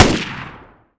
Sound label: sentry; snds jack gmod